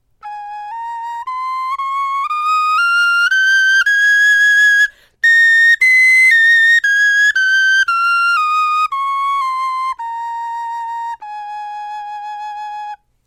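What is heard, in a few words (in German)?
tonleiter, Knochenflöten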